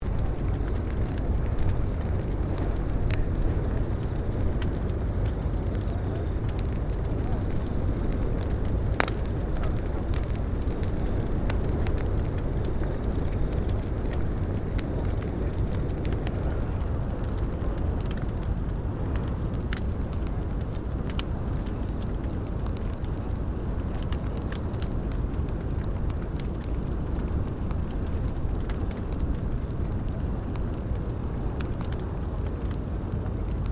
Fire Burning Off Medium Established Distant Loop, Controlled fire burn; Flames (Sound Effects:Objects)